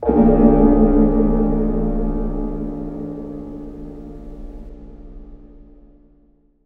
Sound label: Space Bed, Strings & Pads; Zero-G Sound Sense Grime Scene; 90 s Sample CDs